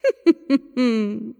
Laugh; Legend of Keepers (gamerip) (2019)

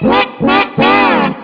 Wa-wa-wow; bart bash

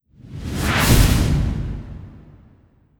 Fire Elem Magma

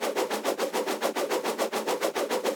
Rotate Loop; Folk Hero

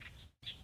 Amb Hong-Kong Bird

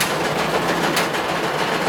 lift; cp rougarou

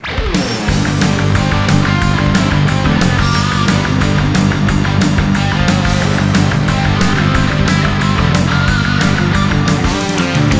Race Start; Midnight Run Road Fighter 2; Konami ZR107

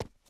Footstep Run Base

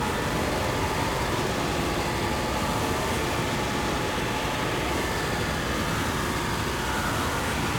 Laser Beam; Likho

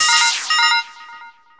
Alert

